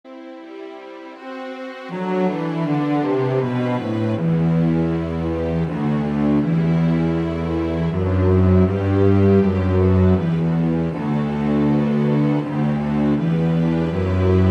Air Cello; Untitled Air

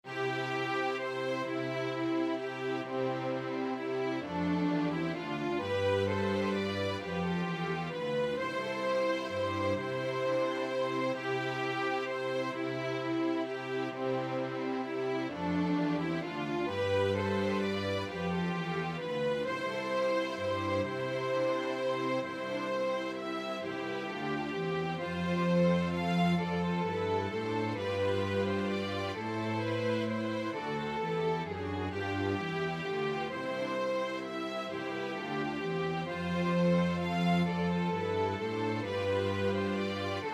Waltz; Wellington

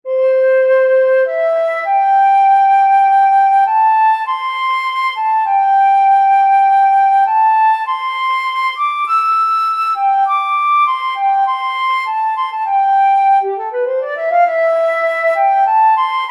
Ensemble Simplified Recorder; The First Nowell

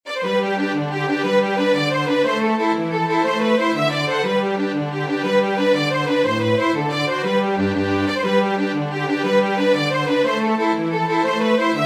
Irish Jig Medley

